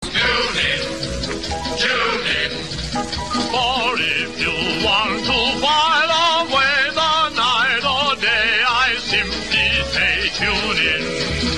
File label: jingle; 1930s; R Luxembourg